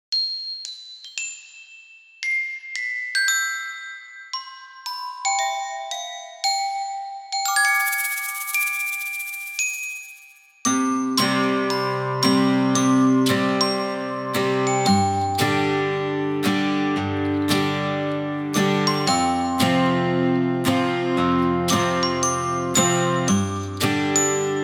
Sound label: RingRing, KIDS; 귀여운